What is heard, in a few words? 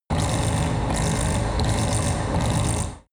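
extrinsic chirp low, energy; energie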